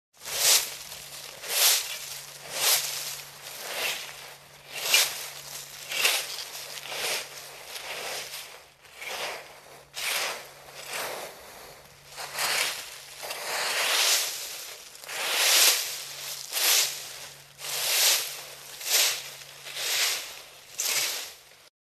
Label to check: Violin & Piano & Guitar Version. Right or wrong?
Wrong